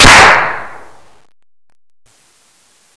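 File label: bullet hit helmet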